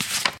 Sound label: Sound Calendar Out; Peace, Death